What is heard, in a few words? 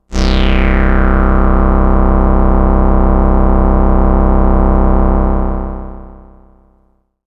Sun-Sigh E, synthpad